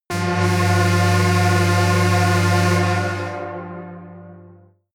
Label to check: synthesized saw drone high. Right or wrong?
Right